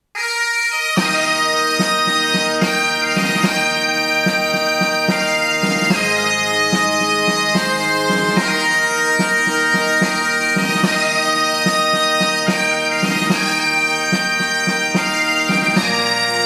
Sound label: amazing grace scottish instrumental